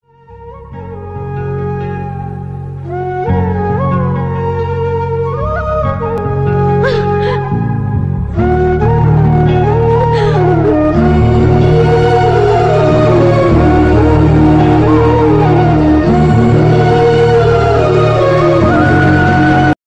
movie bgm ringtone telugu bgm ringtone south indian bgm ringtone; murari